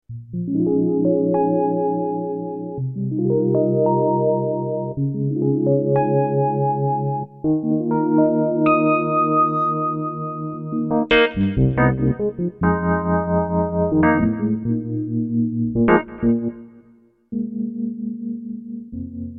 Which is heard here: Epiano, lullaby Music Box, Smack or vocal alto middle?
Epiano